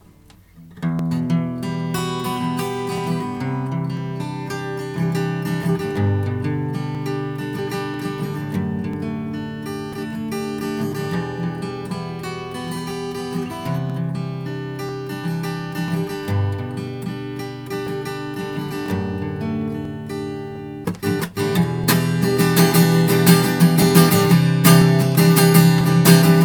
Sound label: (Acoustic)